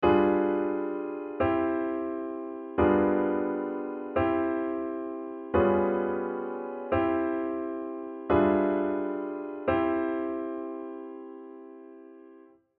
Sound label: altered chords to tonic